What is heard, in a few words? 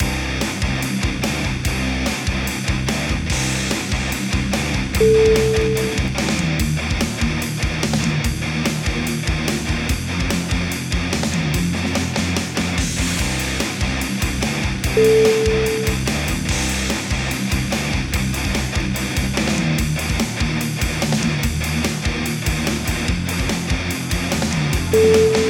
heavy metal power